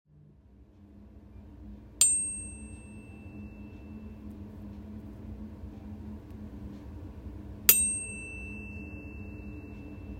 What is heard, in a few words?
cosmic tuner